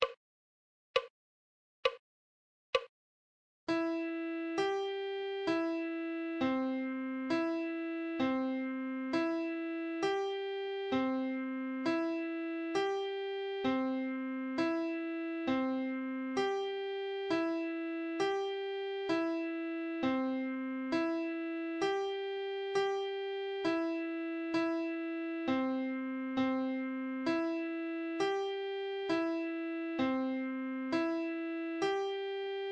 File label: musical reading in treble clef Adagio